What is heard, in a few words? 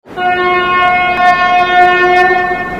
klaxon, Train; OpenBVE; EU07-112